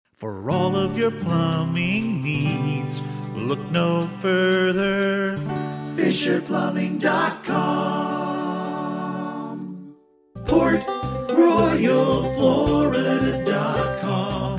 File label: jingles